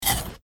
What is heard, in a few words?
eat ice candy